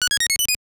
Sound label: 1-Up, Sound effect of 1-Up; SML